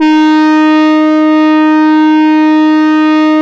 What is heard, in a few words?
REED, E-MU Producer Series Vol. 6 – Analogia Project, Strictly Synthe, Smoov Leadz